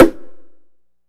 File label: Low Bongo Slap; Drums; One Shots; Percussion; Groove Elements